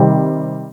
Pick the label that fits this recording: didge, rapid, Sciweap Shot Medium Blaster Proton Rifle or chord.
chord